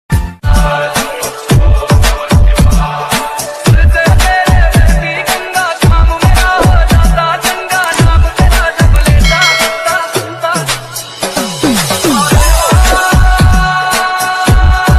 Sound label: mahadev ringtone new bhakti ringtone lord shiva ringtone; shiv shankar